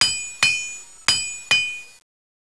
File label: weapon work